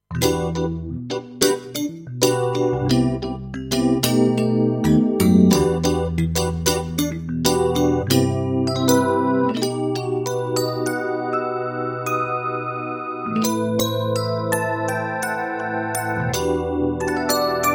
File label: Super Dyna Chorus